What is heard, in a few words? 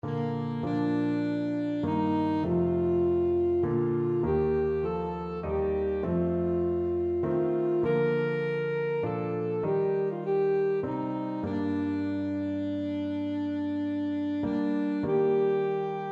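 alto saxophone; great creator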